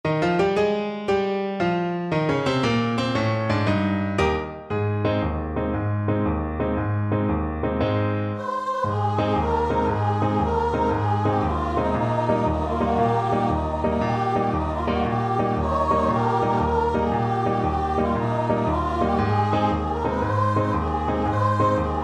choir; luna mezzo mare

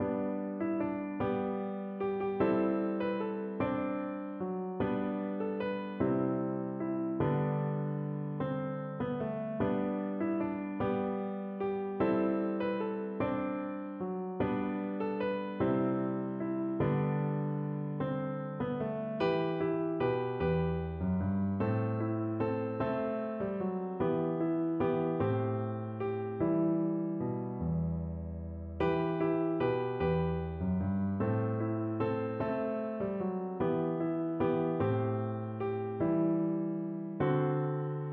skyeboat, piano

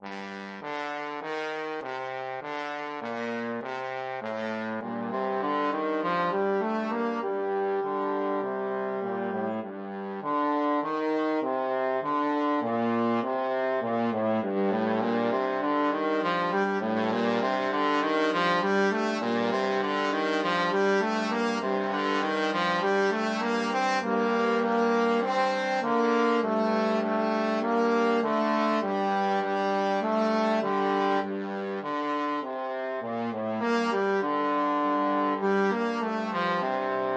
trombone duet

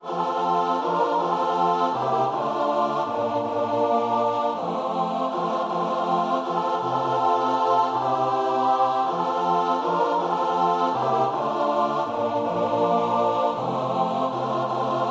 choir; jesus keep me near

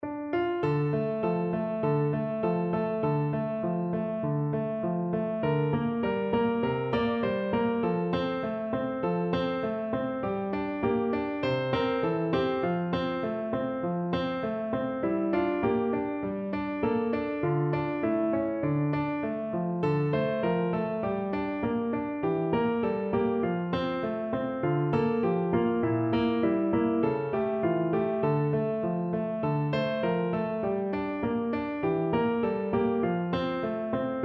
piano

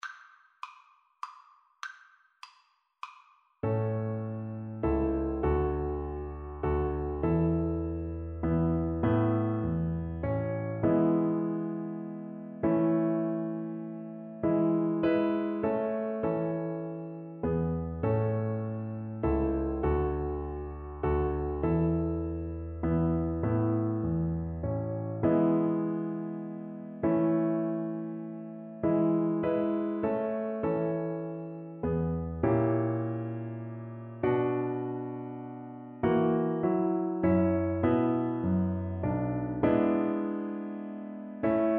Double Bass

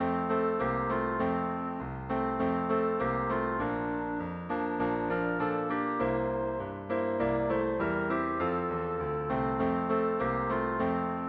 jingle bells, clarinet

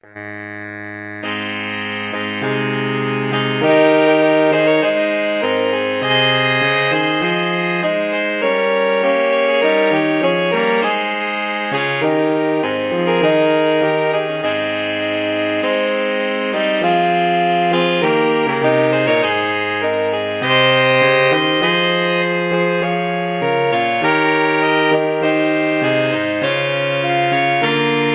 Overture, saxophone quartet; Fireworks

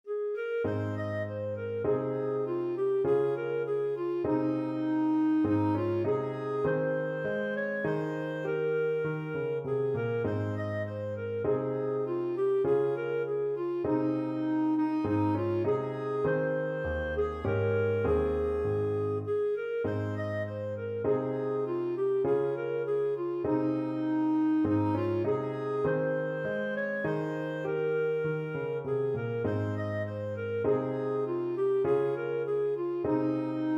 clarinet; shove that pigs foot

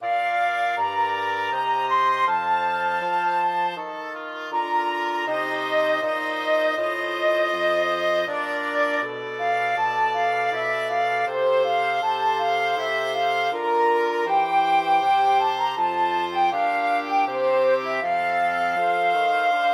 flexible mixed ensemble, 4 players

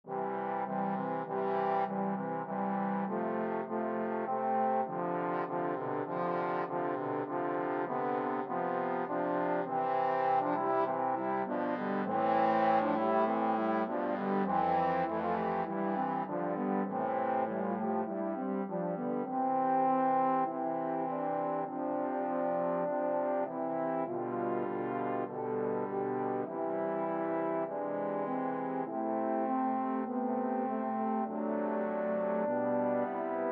trombone quartet

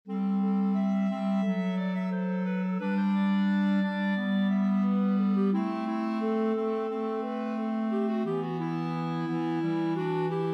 clarinet trio; borodin

